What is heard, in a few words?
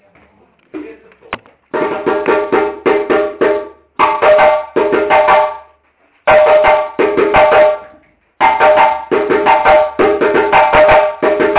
Tiriba Solo